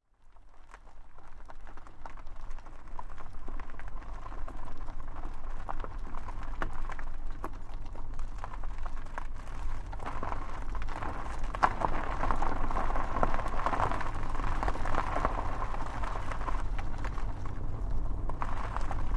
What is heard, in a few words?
Car Tire Onboard Gravel Dirt Roll Slow Long; Cars